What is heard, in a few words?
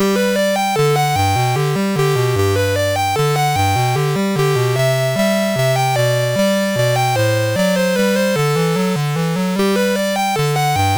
Minuet in G, CSG8580R5